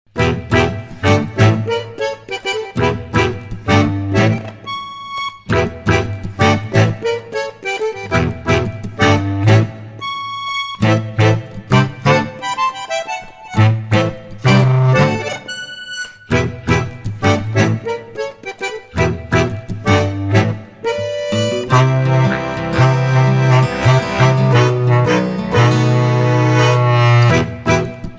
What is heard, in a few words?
pianosong